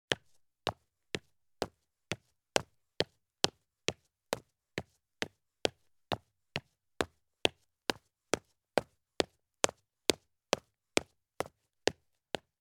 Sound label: Police Boots Take Fast Steps on Concrete Stairs; Sound Ideas; Footstep Sound Effects